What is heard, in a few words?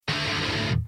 Guitar Intro Heavy